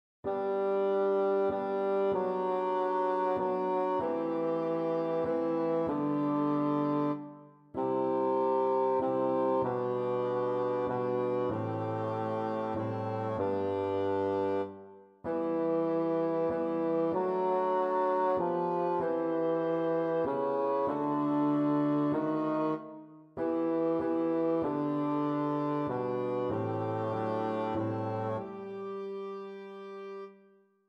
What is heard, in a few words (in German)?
Bass Mittelteil langsam